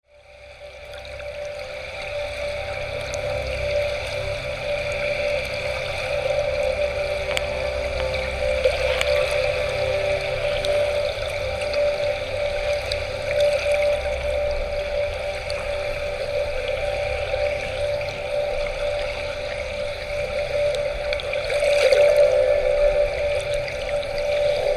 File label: Deep Water